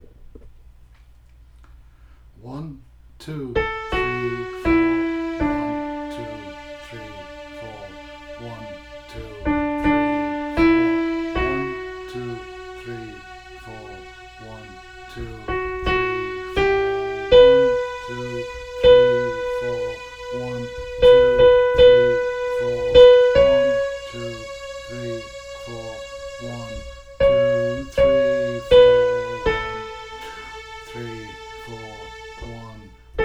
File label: water is wide whistle